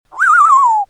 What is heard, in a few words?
monster unclick